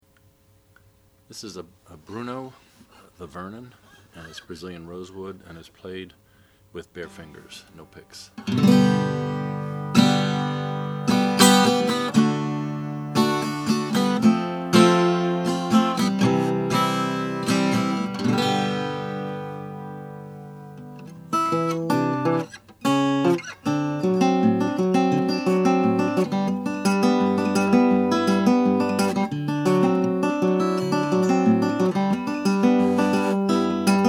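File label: bruno the vernon natural, Guitars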